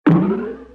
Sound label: boin